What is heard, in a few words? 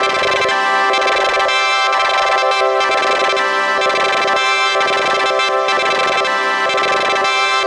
Drown Pad; пэды